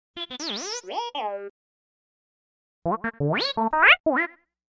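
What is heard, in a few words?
Droid, Emotion Frustrated angry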